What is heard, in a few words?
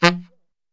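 F saxophone shot, Brass Super Section; Best Service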